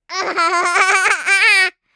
tickle, baby; CosmicRage

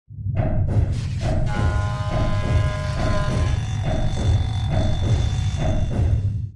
repair, deep space repair; CosmicRage; ships

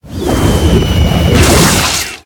hawk dive; CosmicRage

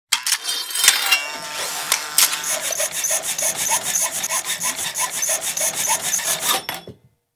build, factory

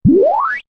ship 2 ship

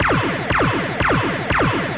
torps, ships combat; CosmicRage

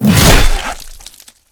flesh, combat, abilities, big claw

